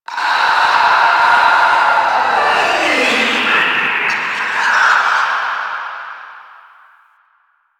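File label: Infested Distant; Warframe; NineteenNinetyNine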